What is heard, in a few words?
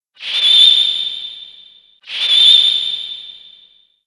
shine